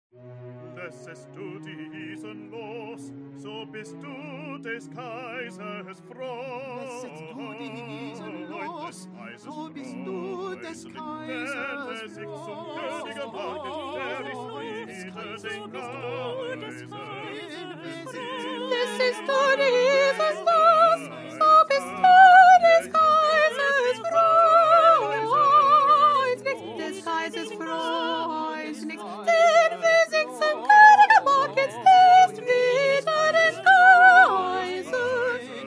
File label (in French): petit choeur sopranes